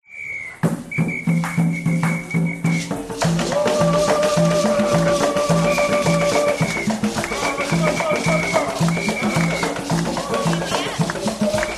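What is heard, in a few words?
African Drums Party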